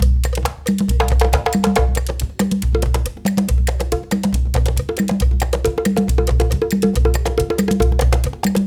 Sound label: 138, Lead Drm, Gyana HiLife; Spectrasonics; Supreme Beats